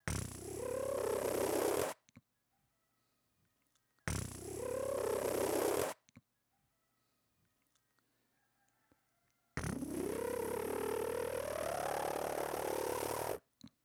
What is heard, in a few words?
bubble scratch